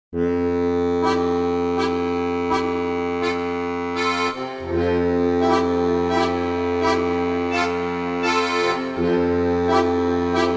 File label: accordeon keybord